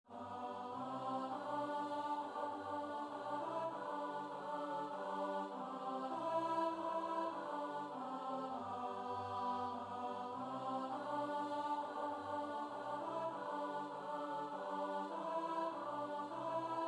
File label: alto